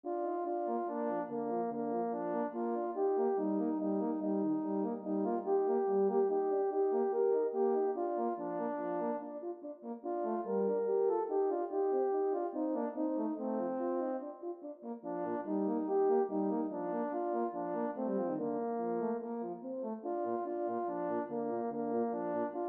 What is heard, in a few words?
Bb Maj 2 Horns; duets; Ridderen og Jomfruen